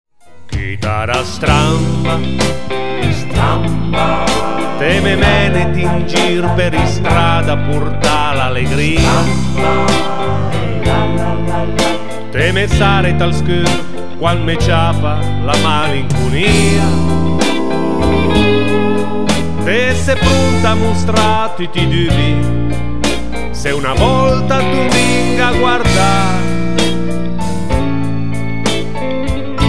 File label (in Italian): chitarra stramba